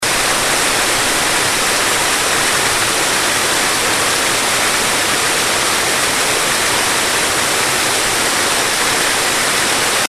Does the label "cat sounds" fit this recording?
No